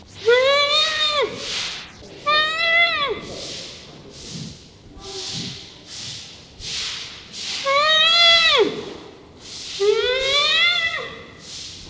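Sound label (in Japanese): kuro sai, クロサイ 鳴き声; 多摩動物公園; クロサイはソマリアからケープまでのアフリカにすんでいます。インドサイと同様、さまざまな声を出すことが知られています。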